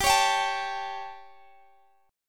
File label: G Suspended 2nd Flat 5th, Guitar Standard; Gsus2b5; strummed; x,x,17,18,20,17; down; capo=3